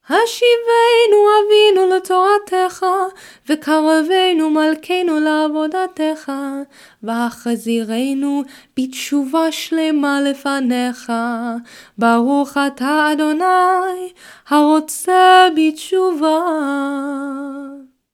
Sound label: Weekday Amidah Hashiveinu; weekday minchah